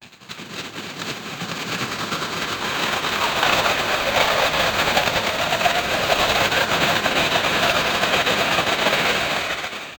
spell, magic; horcrux

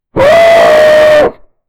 bears roar; animal vocalization

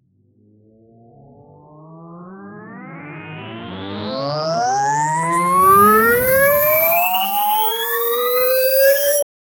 a cinematic ascending ris, riser

